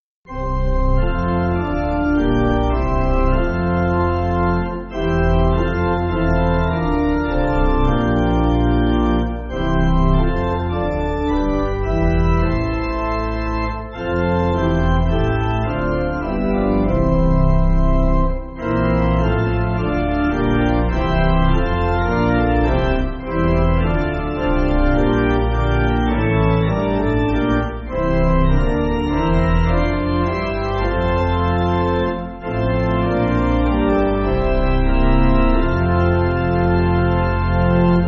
Pipe, Music Backing Files for Churches; You Called Me; English Melody

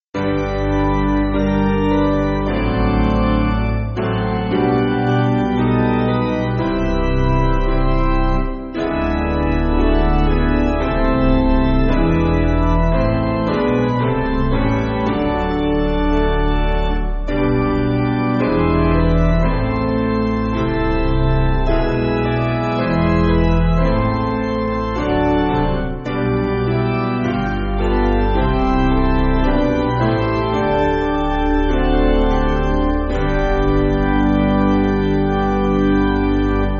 Piano; Basic Piano & Organ (CM)

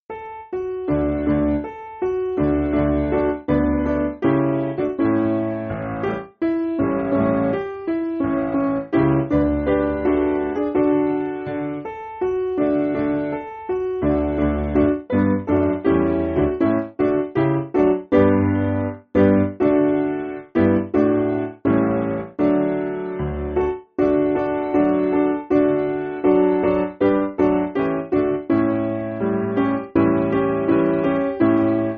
He Is Alive Piano; Simple Piano

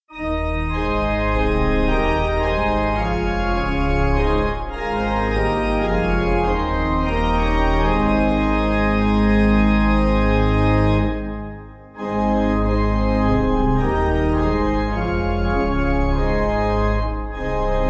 Pipe

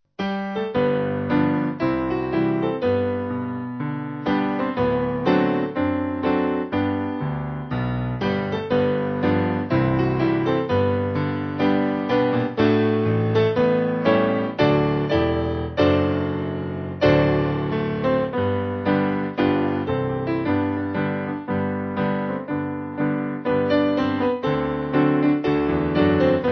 There Is Sunshine, Piano; Sweney

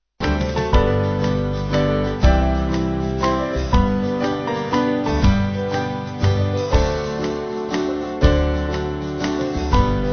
Band

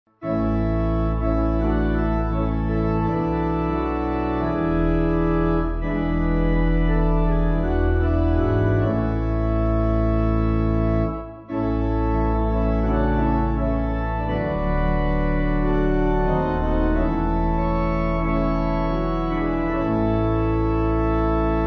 Pipe

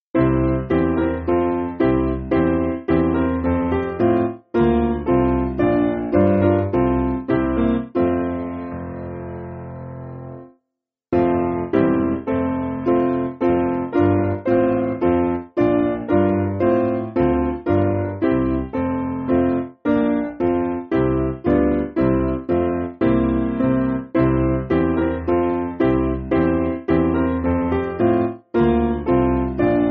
SPiano; Glory Honour Praise; Madan

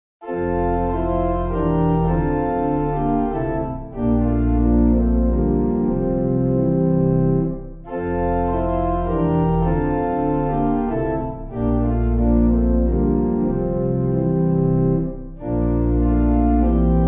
Incarnation Pipe

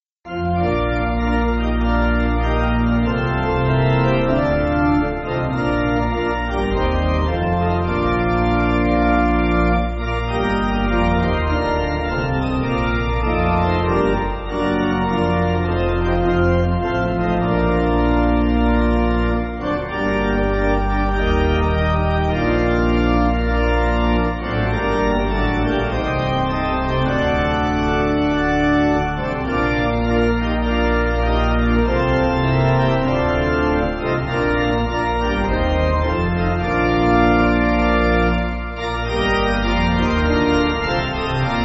Pipe Organ, Music Backing

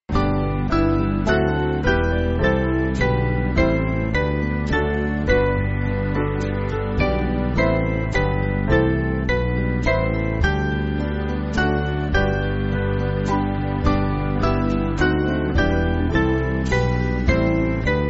Band, Backing Files for Churches; Pleasant Are Thy Courts; Maidstone

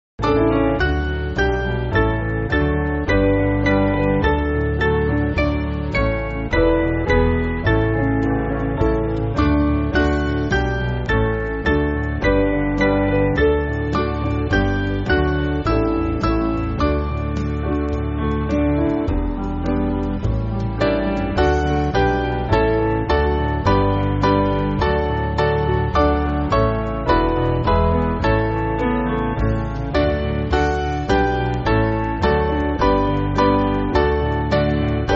Piano, church music; Come Spirit Blest; Tallis Ordinal

